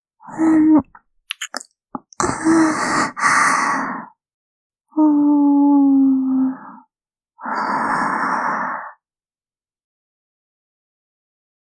攻めキス, ASMR キス

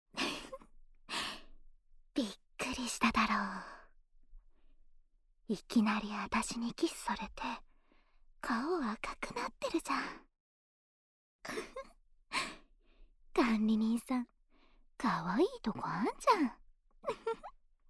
キスと耳舐め（右耳）

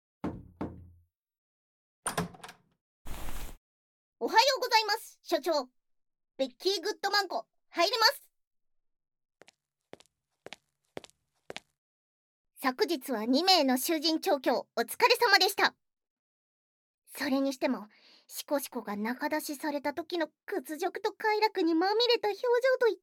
嬌声 ASMR

